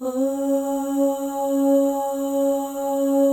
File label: OH, FEM, Symphony of Voices; Spectrasonics